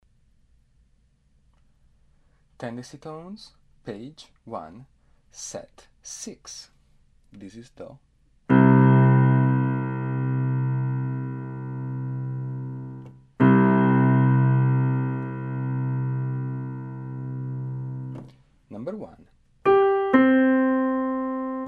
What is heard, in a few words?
tendency tones